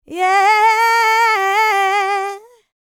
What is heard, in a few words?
LICKS, Vocal Planet, R&B; ILIO; Dance; LT R+B